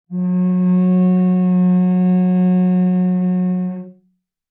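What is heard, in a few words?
Cello arco fortissimo sul C F3; MIS Pitches - 2014; Strings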